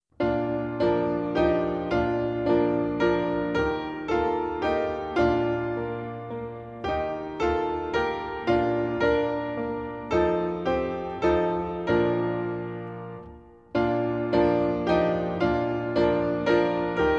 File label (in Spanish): musica